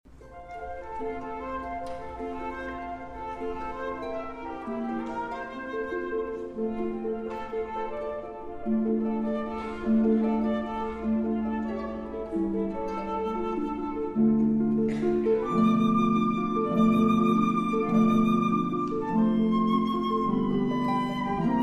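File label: Flute Harp, Flute and Harp Concerto Cadenza; Mozart Flute and Harp Concerto Cadenza to Movement #2; Tulare County Symphony, April, 2006. Fox Theatre.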